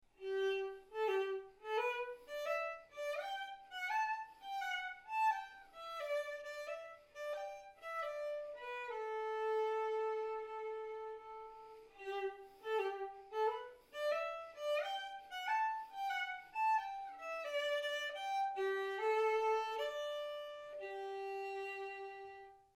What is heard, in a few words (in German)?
geige in wohnzimmer